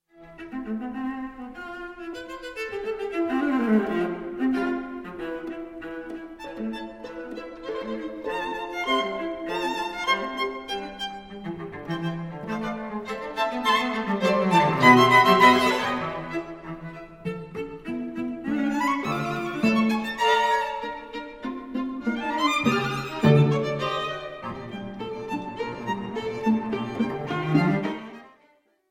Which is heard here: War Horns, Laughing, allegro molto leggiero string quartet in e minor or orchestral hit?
allegro molto leggiero string quartet in e minor